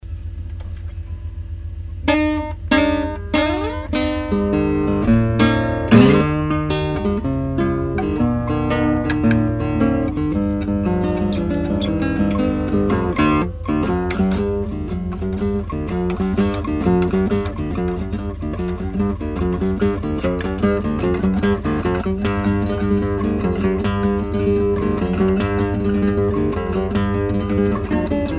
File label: rainy day blues, guitar